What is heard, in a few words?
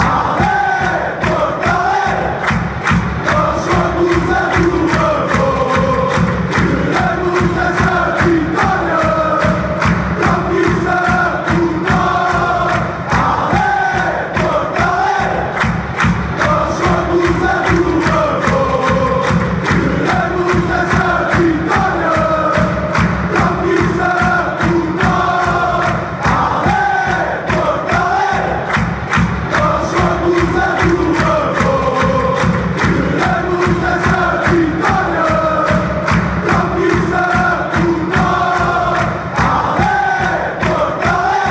allez porto allez, fanchants; pfc